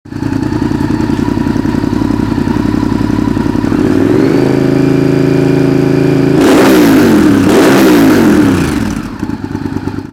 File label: honda vtr1000 sound, exhaust